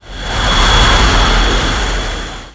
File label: esc jet; escape2